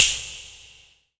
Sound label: SNAP; Best Service; Dance Mega Drums; SNAPS